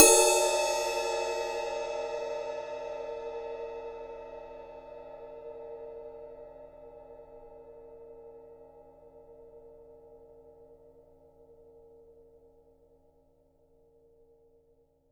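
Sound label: Cymbal Ride 51cm; Polyend; Simulacrum Sounds; 1 Shots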